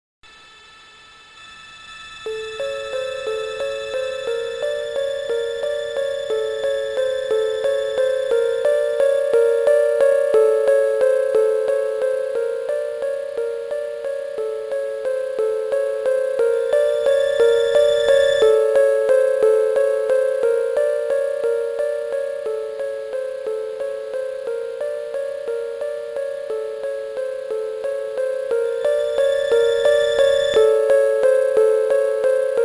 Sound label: music environmental mystery; metroid fusion; mesa facility